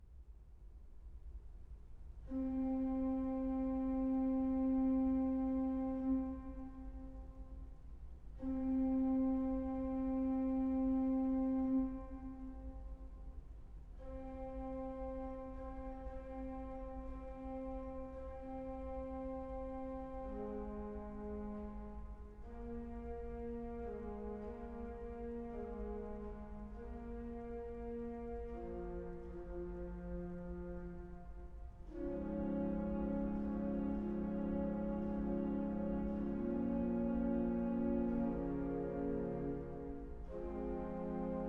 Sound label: Requiem for organ; Franz Liszt; Requiem eternam